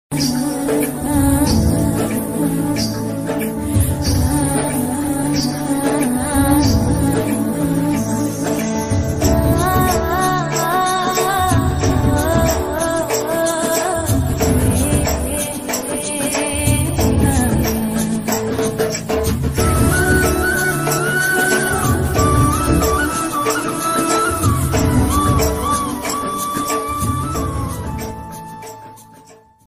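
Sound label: telugu love bgm ringtones tamil love ringtone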